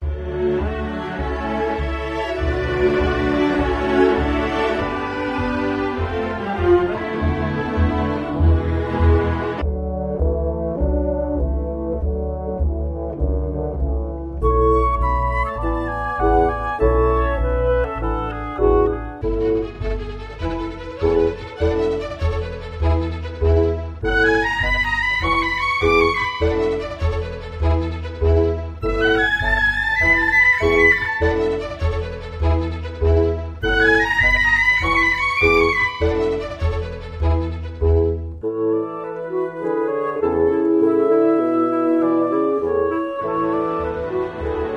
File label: Romantic; Hyperspace Delivery Boy